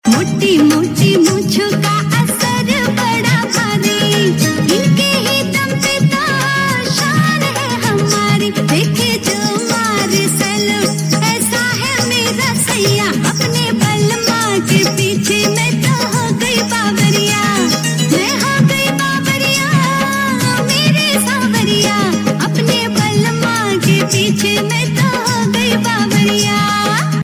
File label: Sangeet Song